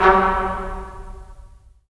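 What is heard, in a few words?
brass trumpets staccato, left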